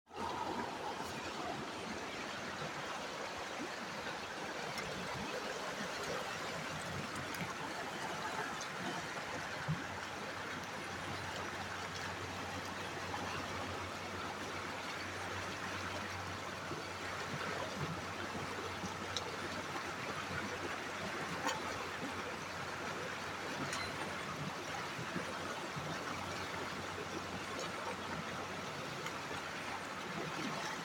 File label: falls sledding hill; berea